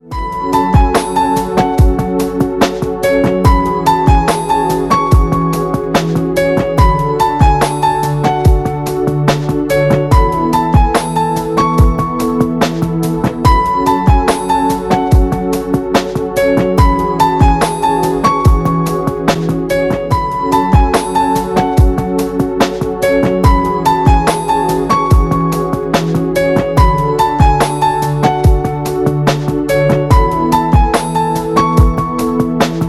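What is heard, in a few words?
romantic music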